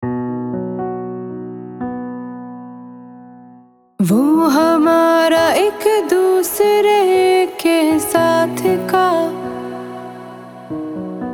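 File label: female sample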